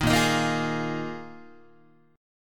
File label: A sharp 7th Suspended 4th, Guitar; A#7sus4; strummed